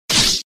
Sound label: Barbarian Attack Hit; gamerip; mighty knight 2